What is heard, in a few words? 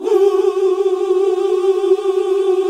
Gosp. Oooh; Gosp. Oooh 2